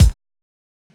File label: DRUM SAMPLS, Disco Fever; Best Service ProSamples vol.48 - Disco Fever; AKAI